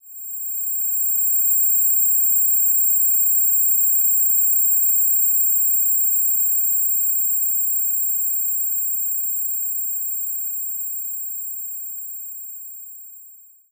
Tinnitus

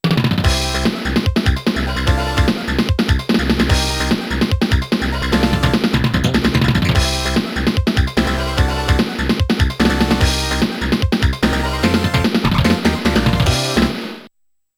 Sound Test OPM

